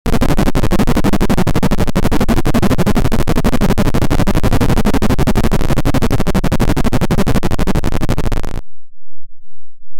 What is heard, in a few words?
8bit game character walki